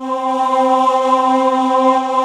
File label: CHOIR; B CHOIR